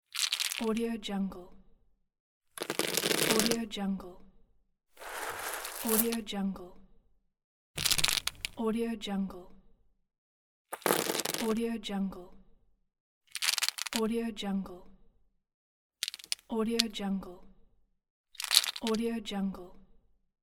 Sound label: nuts pour; domestic sounds